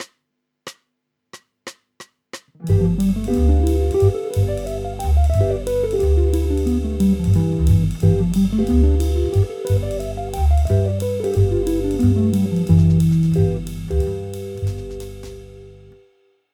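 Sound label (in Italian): Esercizio G7 in posizione di G in drop 2, chitarra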